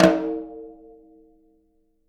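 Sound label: jazz snares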